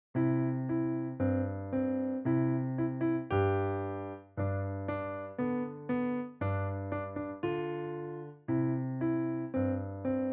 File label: Skip To My Lou Piano